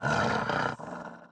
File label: Dog Angry; unsighted